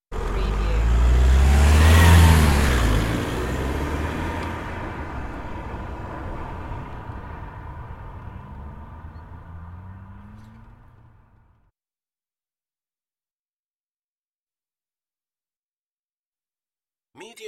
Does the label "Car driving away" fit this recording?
Yes